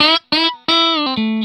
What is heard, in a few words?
RIFF 120, Vintage Blues Guitar